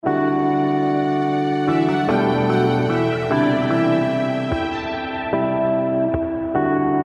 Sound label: Inst